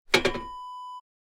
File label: bicycle kickstand down